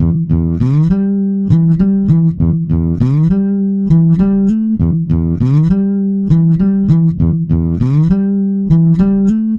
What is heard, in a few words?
bass, monophonic; apple logic pro